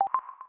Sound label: Soft, Keytone sounds; LG GD550 Pure